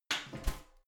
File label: garbage can close lid spring click